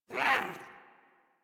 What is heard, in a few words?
Catbrow Pet Speak; Warframe; Pet Ship